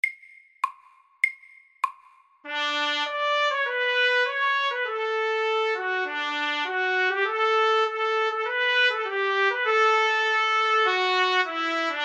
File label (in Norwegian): Etyde i E dur FORT